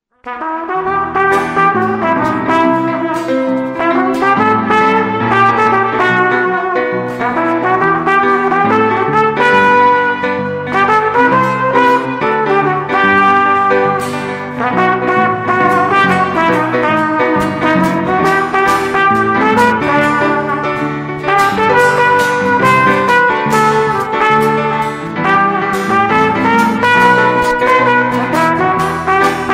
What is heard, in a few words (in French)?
Musique d'entrée